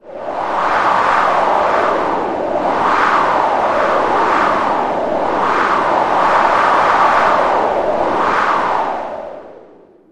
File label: wind